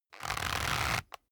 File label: leather creak short medium